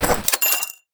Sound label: Powerup Wallet Trigger